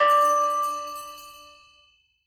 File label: Scatter Reel Stop; Wu Xing